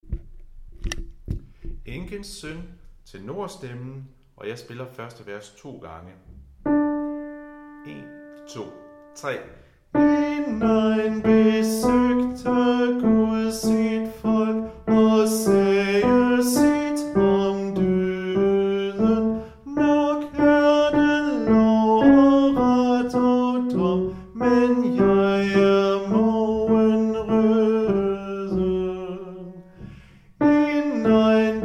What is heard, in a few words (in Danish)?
tenorstemmen; Enkens søn